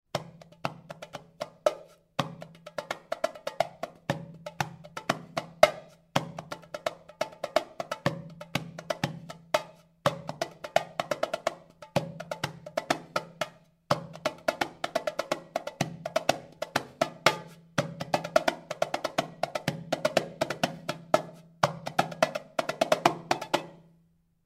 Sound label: Darabukka